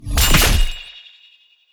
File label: loyal sword